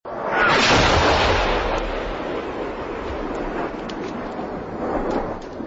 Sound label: boom